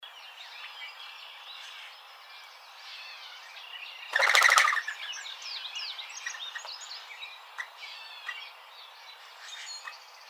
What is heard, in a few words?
Mourning Dove, birds